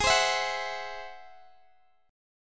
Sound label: G sharp Augmented 7th G sharp Guitar DADGAD